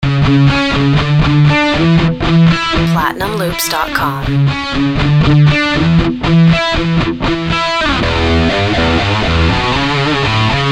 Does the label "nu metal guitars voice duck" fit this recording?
Yes